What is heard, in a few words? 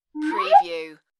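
Slide Whistle Rise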